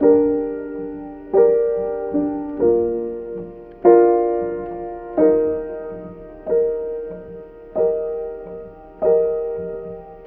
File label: piano, Loops Sad